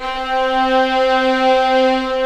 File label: STR VIO; AKAI S-Series CD-ROM Sound Library VOL-2